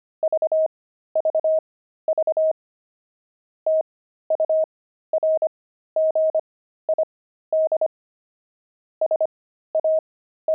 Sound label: pangram saxophones